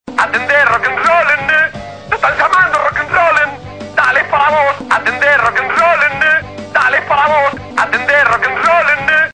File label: rock and roll; alternative